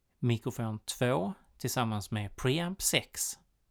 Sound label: mic pre